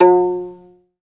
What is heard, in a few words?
SOLO VI, Synclavier Strings; ILIO